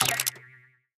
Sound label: quick Stop; netent; whenpigsfly